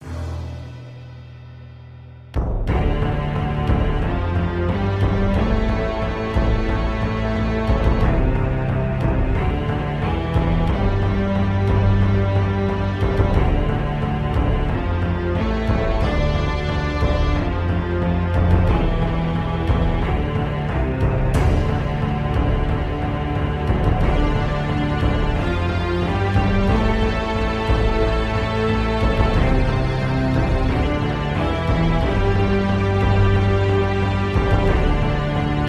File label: strings and percussion; syntheway strings and percussion kit